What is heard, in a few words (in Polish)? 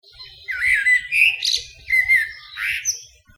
kos spiewajacy samiec